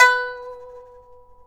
PIPA B3; INIS; Opium

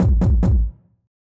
Beat - Kicks, Grime, 140bpm; Zero-G Sound Sense Grime Scene; GS Construction Kits; Just Flex

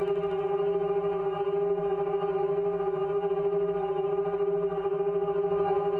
Tonal Re Draw, sparse soundscape

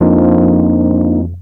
Rhodes, Keyboards; Drum & Bass; Total Drum&Bass

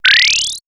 100% Warped, synthfx; X-Static Goldmine

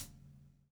SLICK, Backbeat

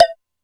Flg Synth, Alesis D4 drum machine

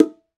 BONGO; World Combo

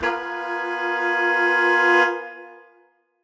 Horn Swell Fminb5; undercover samples

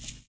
ATMOSPHER; Univers Sons

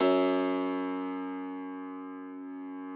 Thumb Tack Piano ff, F0; ThumbTack Piano ff -ped; Best Service ProSamples vol.53 - Classical Prepared Pianos; Total Piano

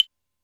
Hi Clave, Alesis D4 drum machine

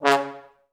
TB STAC C#2, Advanced Orchestra; Best Service; Peter Siedlaczek's Advanced Orchestra

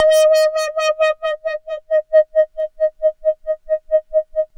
LFO EB4; Big Fish Audio - First Call Vintage Keyboards

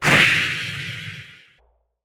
Percussive Hit, contact mic

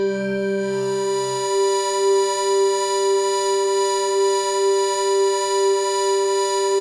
TRIAD C3, SYNTH; Trance; AMG - Global Trance Mission VOL-2

